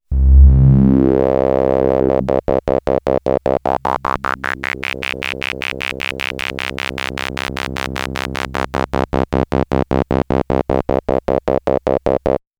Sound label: moog satellite bow fx